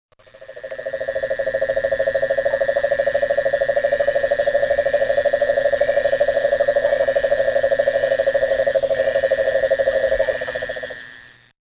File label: Cane toad